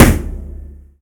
trebly kick drum sample c sharp key

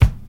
hq studio steel kick drum sound g sharp key

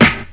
90s crisp hip hop snare one shot f key; snare-drum-samples

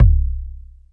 smooth bottom end kick single hit c key; Duration 0:01

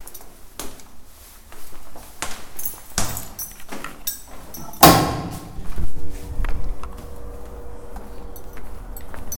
stairway and exit

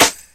focused acoustic snare g sharp key; snare drum

